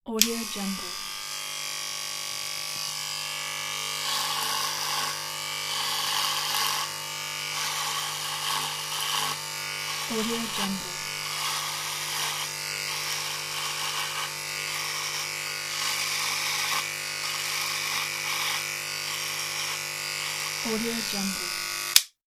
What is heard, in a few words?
electric shaver